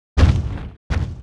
mob raid run; Monster; 레이드; 금갑신마

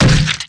Wood Heavy Bash Flesh; Monster

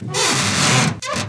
door open

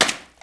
leather bash flesh

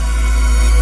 skill shot charge; SkillSound

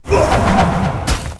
mob die, Monster; Bossmob; 금전방; 금적산

